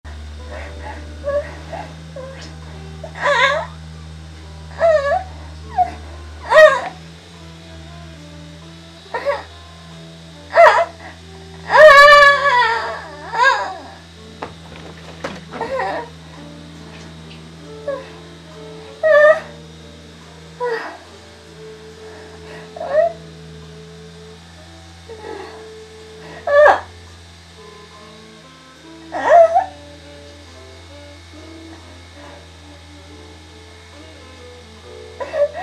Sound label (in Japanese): えち音声